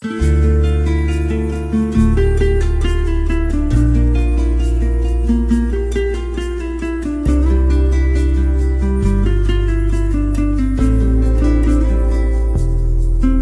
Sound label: romantic spanish